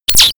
high digital signal

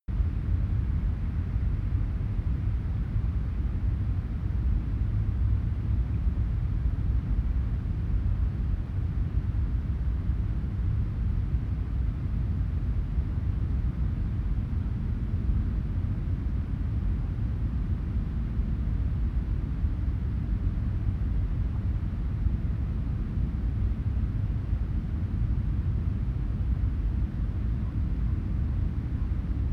winter lake frozen

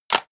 medieval menu light close

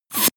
air pressure release

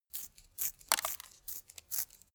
sharpening pencil

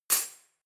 cutlery knife drawer place hard

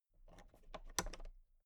toolbox latch close soft

